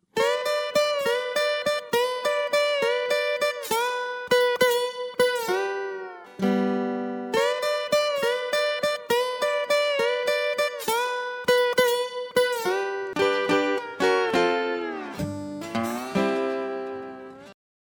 Blues

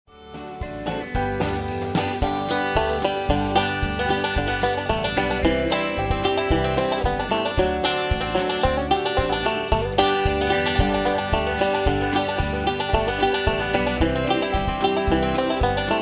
Jingle Bells

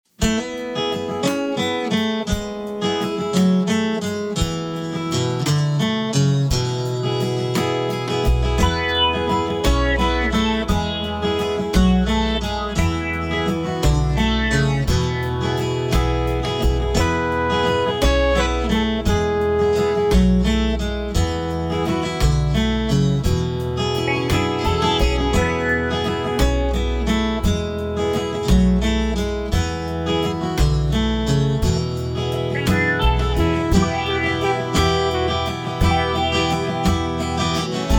Guitar; lesson sample; level 1; Full Demo & Backing Tracks

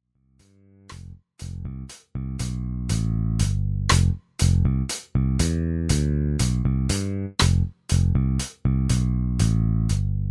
Bass

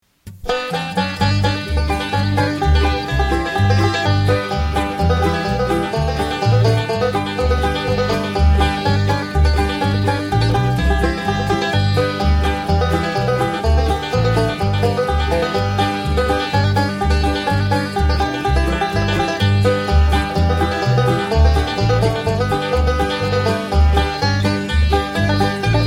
Banjo

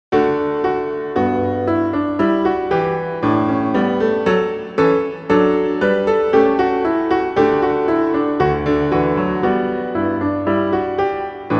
Gavotte

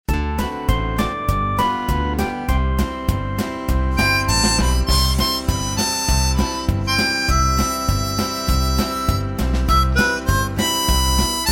You Gotta Start Harmonica

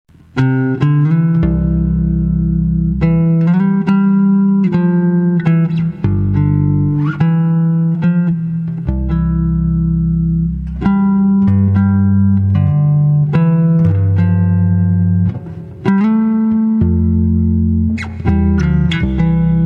Bass

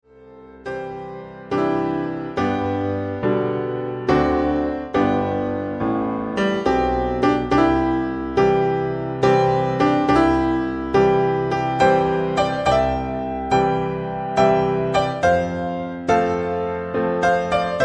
Waltz Key of C

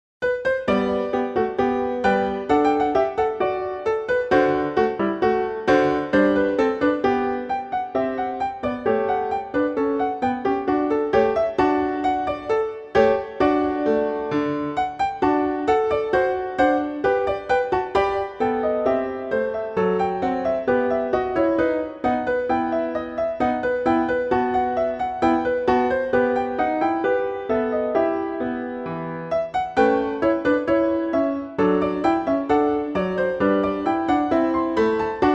Bourree G

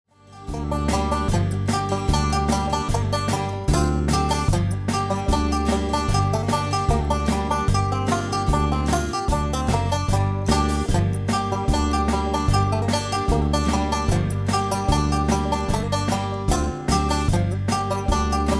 Kentucky Mandolin; Lesson Sample

